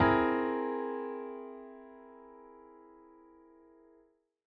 tetrad aug7

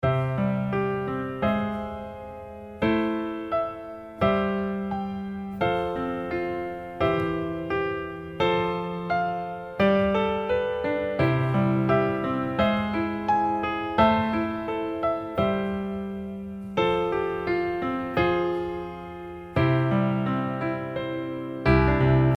add9 form arrange A

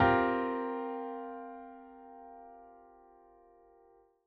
tetrad 7sus4

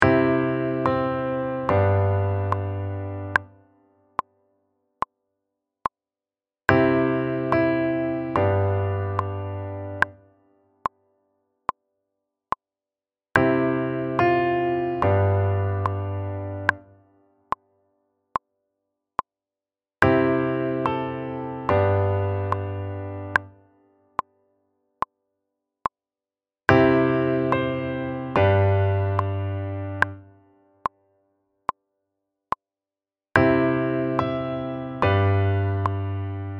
approach note, accompaniment pattern

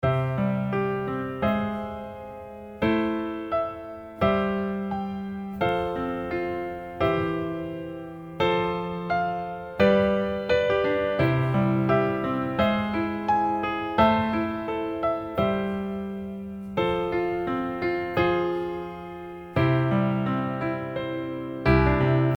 broken arrange A

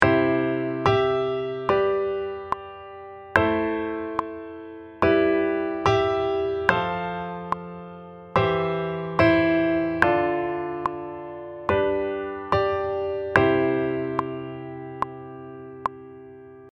chord tone, accompaniment pattern

